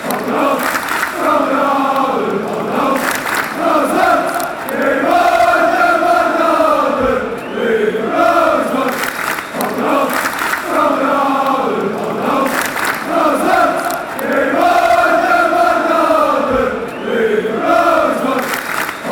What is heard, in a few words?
chant; club brugge